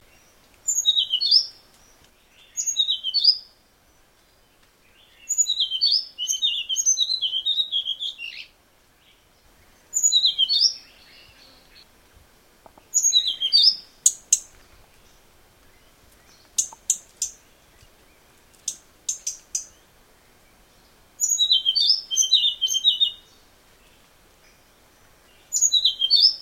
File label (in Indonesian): suara kicauan sikatan hainan betina